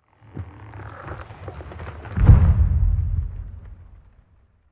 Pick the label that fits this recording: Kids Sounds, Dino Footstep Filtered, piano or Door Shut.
Dino Footstep Filtered